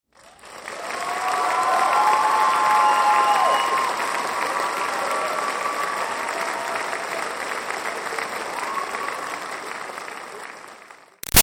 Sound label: Crowd Applause Cheers Audience Grad Big; Auditorium; Graduation; Applause (Sound Effects:Human); Big Room Sound